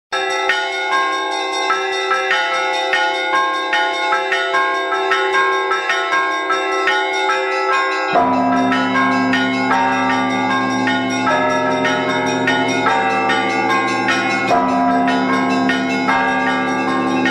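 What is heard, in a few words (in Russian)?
pashalnyi zvon kolokolov, Easter bell ringing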